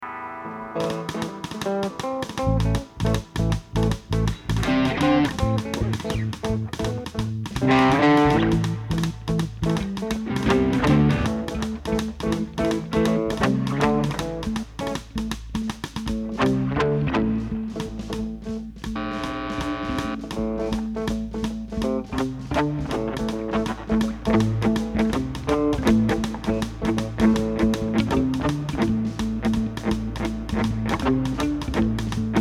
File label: Expensive Drum Samples Mix